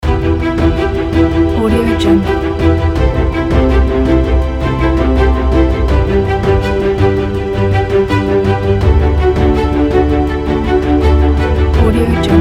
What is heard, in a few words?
epical